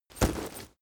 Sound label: leather metal impact